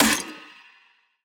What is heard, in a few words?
Snare, Dubstep Snares